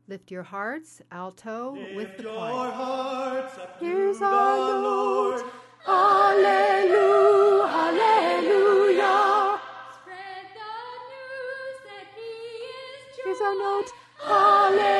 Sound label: alto w choir; alto oratorio